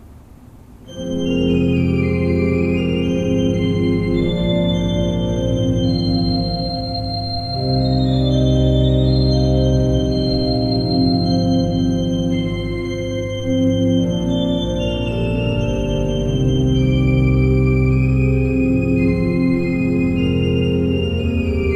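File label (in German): Seifert Koeln, pfeifenorgeln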